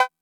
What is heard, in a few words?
cowbell; MFB-522